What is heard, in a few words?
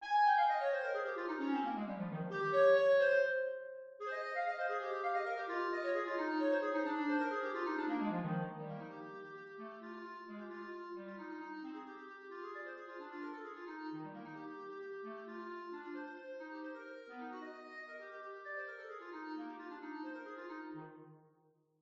Clarinet Track